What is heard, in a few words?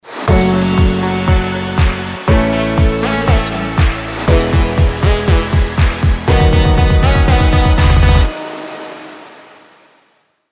logo ambient summer vibe; vesh-studio